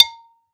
Flower Pot Hard; Flower Pots

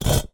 Drag, Vase

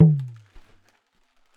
Talking Drum; Percussion Samples

